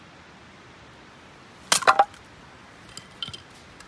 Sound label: Chopping